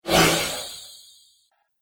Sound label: Ghostly Glimmer Paranormal Activity; Ghost Sound Effects